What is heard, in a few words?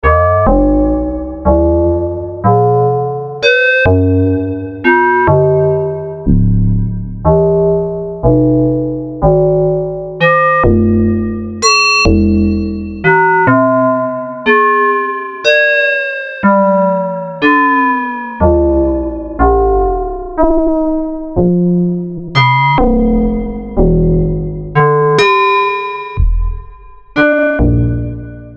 Bells